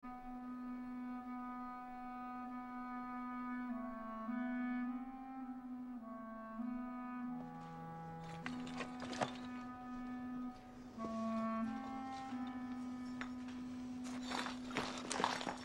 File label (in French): Solo clarinette